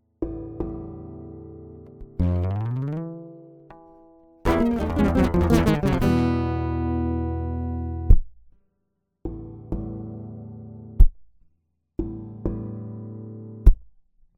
knock knock

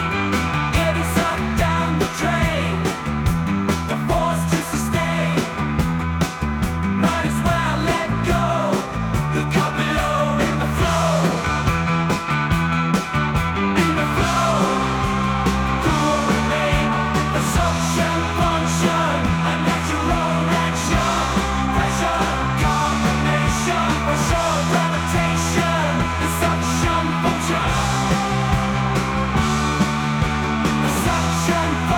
Suction (Water Down the Drain)

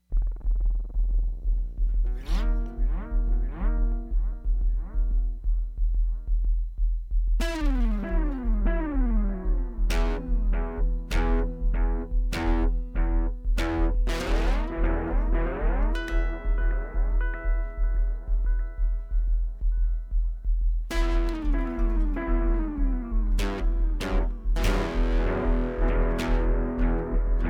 Amplification feedback loop

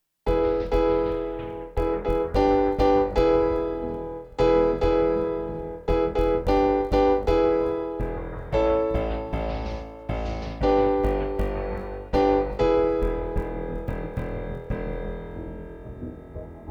piano prelude

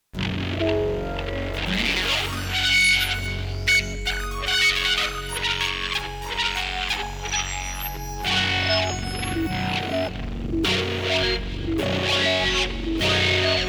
synthetic

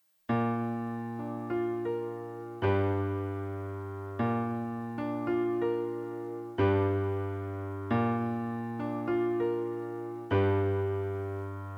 piano

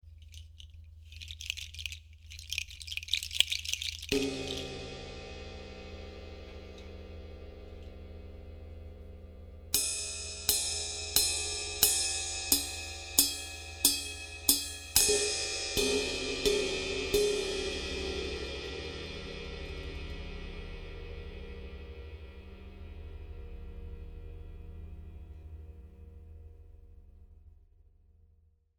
percussion

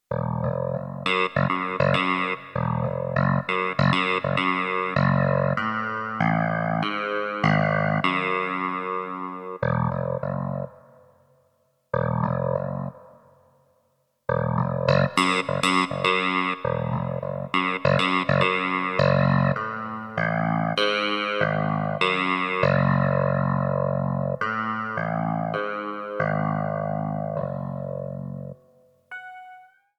clavichord

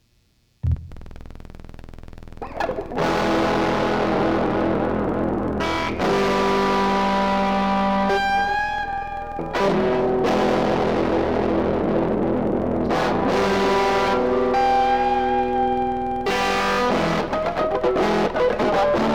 Feedback Phase intro

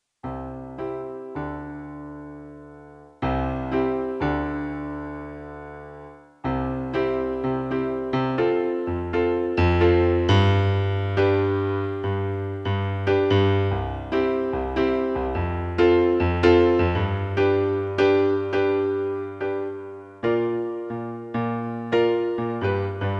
piano

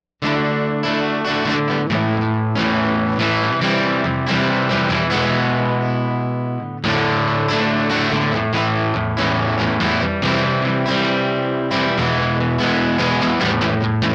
electric

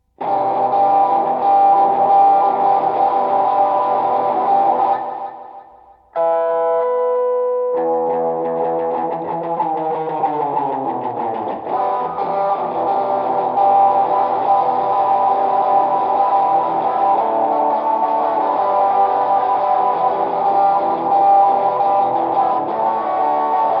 Electric; MegaEpix

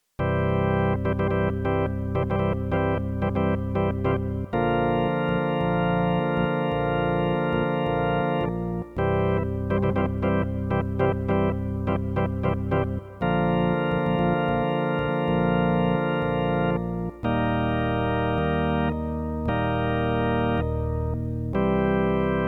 organ

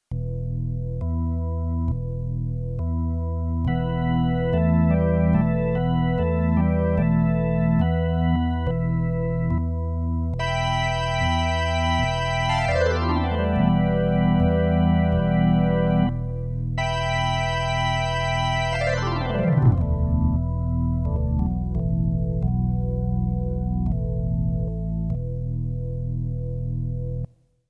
Organ; The Notes Are Flying

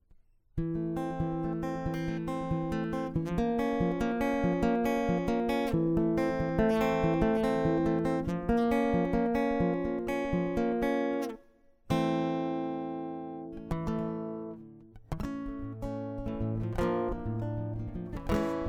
acosutic

